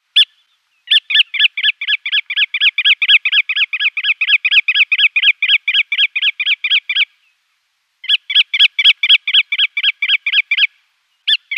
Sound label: Bar tailed Godwit display